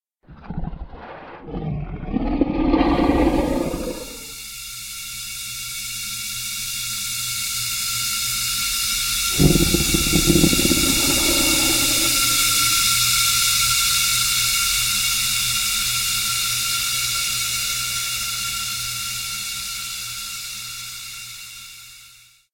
Gold Dragon Breath Weapon Weakening; SyrinScape; Vault of Dragons

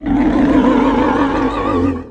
scrm; The Elder Scrolls III - Morrowind

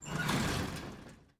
shutter rise; Papers Please

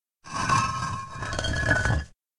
Medium Rumble Walls

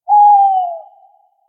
Tawny Owl; SyrinScape

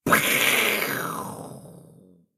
splosions

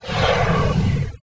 moan

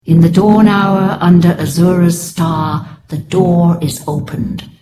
cave open; The Elder Scrolls III - Morrowind; Morrowind GOTY GOG

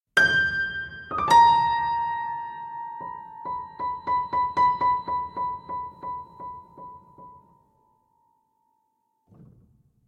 piano notes; SyrinScape; Madness in the library

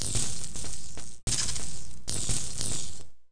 Sparks; Sotha; The Elder Scrolls III - Morrowind; Morrowind GOTY GOG

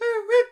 bird; Syrinscape unplugged; Jungleplanet dinolaser battle

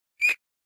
Rat Squeak; SyrinScape

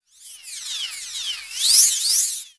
magic

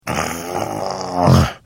Hit, Vo; The Elder Scrolls III - Morrowind; Morrowind GOTY GOG

